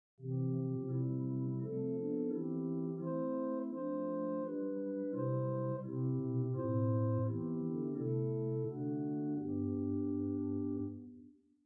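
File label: Great Dulciana, organ; Prudhoe Methodist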